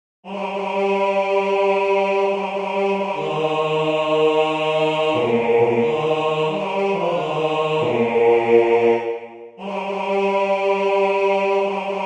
Training Basses

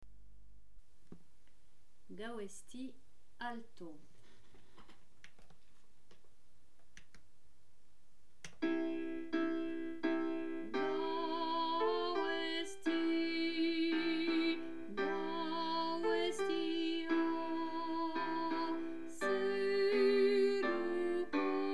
4 vx Training alto Chanté; Gau Ezti

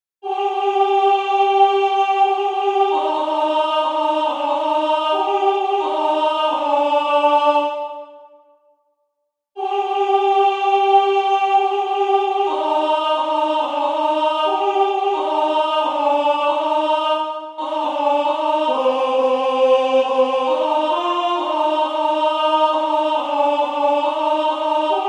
Training Alti

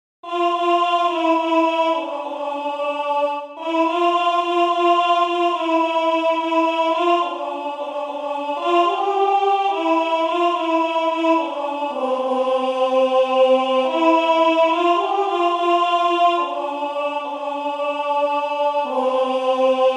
voix mixtes Alto Tempo 72; Maitia nun zira; Urunuela

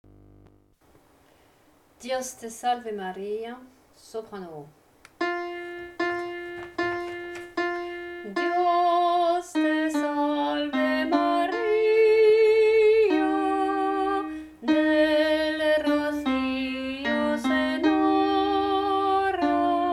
Training Soprano Chanté; Dios te salve Maria

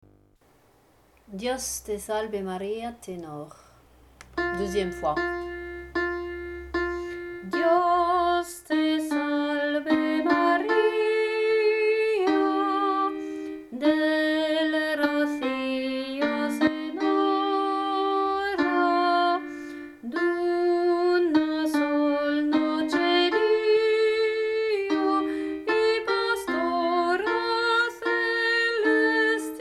Training Tenor Chanté; Dios te salve Maria

